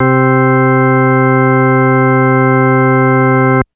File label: Organ B3 c3